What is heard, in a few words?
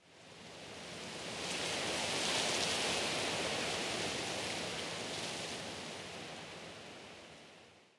wind, ambient; STALZONE